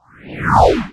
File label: motion; animal